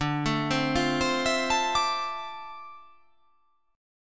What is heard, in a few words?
D Minor 9th, down